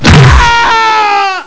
big hit; Carmageddon

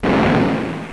explode; Games.Rick Dangerous

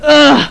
URGH; Games.Carmageddon

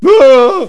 AARGH; Games.Carmageddon

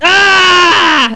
GRRRRRR; Games.Carmageddon

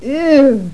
eeuuw; Carmageddon

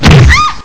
big hit

